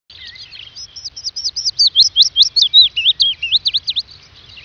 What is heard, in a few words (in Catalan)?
ocellets